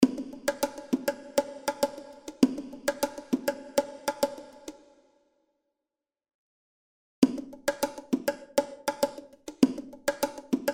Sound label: EMT250